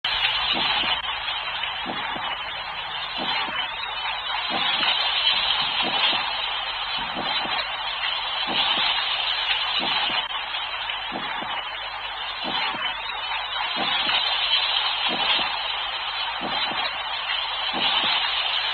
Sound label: White Noise